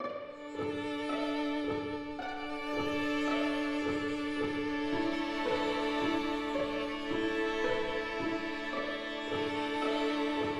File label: d major classical string theme 110 bpm; LOOPS